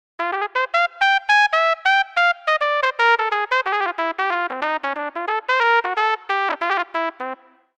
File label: Warm Bebop, solo trumpet